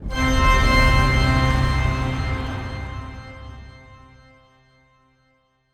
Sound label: Organ Chord Note B Sharp; Polluter Organ